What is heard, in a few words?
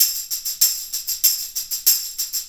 Tambourine